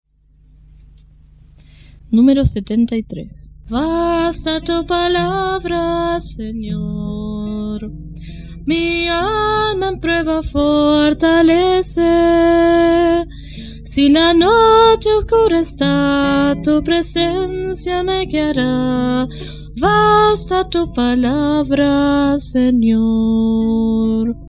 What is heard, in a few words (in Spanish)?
Coro, himnario niños